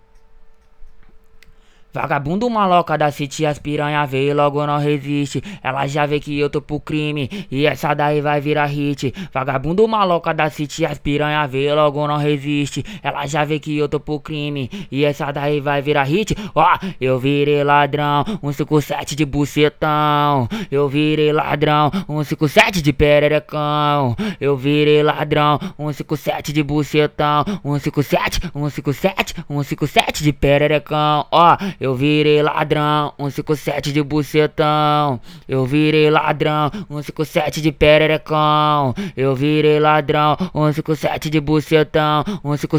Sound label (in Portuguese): ACAPELLA